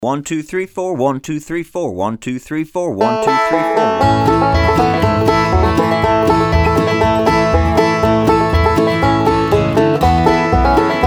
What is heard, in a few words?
Beginner Banjo 120 BPM